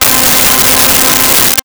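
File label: Synth Strings C5; Nintendo; July 2020 Gigaleak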